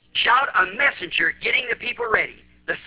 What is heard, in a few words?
Shout; Godhood